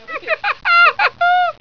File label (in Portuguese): risada